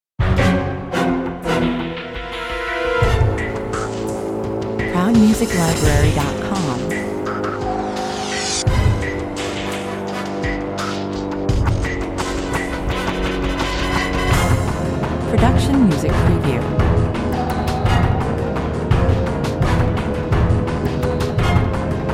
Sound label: Dark percussive industrial sci-fi cue, 85 bpm